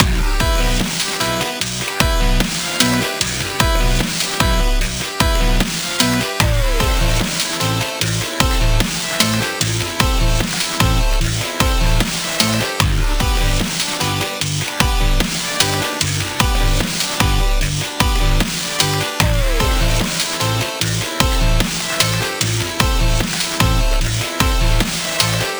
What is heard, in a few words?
GAME OVER; NGC